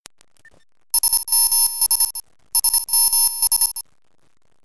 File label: Incoming Data